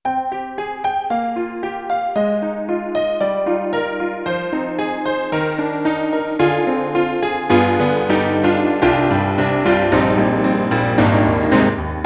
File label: Roland SonicCell Honky-tonk